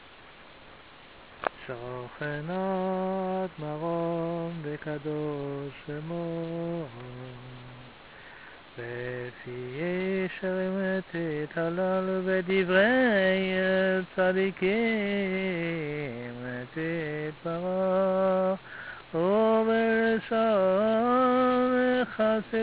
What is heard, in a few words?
Chazanut, Prayer and Song; Shochen ad